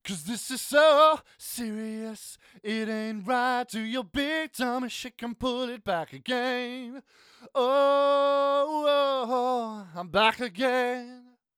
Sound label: Vox Tonal Para Comp Out